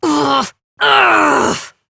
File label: Effort Heavy, Adult; Warframe; Duviri; Drifter; Efforts